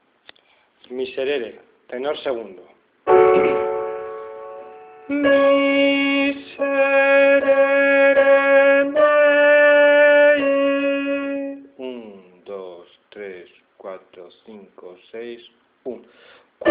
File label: tenor II